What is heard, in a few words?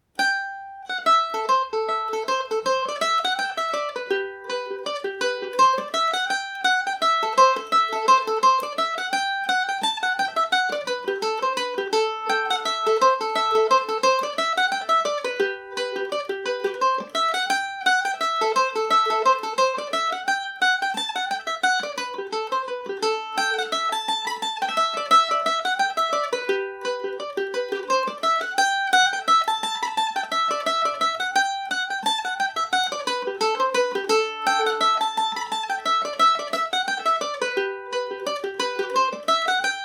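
A dorian, Irish mandolin; swallows tail revisited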